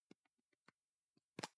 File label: plastic can lid screw open soft long; objekte; dose